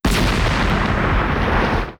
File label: explosion